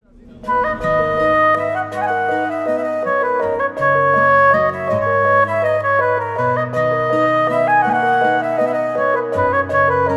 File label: Song Instrumental Tone